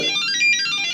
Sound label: Pager Tone